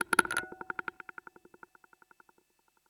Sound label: Pedal Audio Effect; Partial Arenas